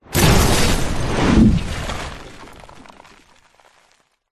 bomb drop jet plane hit explode close sound of plane above short